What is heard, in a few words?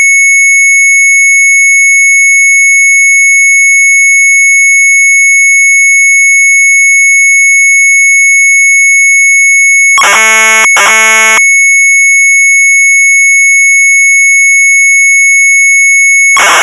Sharp MZ800 Tape